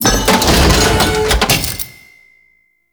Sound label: Thumper Part Pickup; Grn Forest; Veilbreaker; Grineer Forest Thumper; Kahl